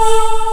voi voice syn; Ueberschall; Techno Trance Essentials

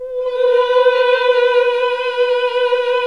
MMH B2, CLASSIC VOX

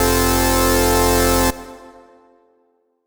lead raved F; leads

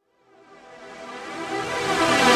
Reverse Synths Emin, Effects; Vengeance - Essential Clubsounds Vol.4

